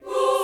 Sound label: choir